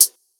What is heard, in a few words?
HIHAT